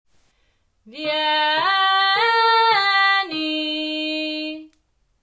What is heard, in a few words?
Belting Vieni